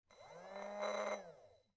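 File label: 15 volt DC motor resistance; 15vdc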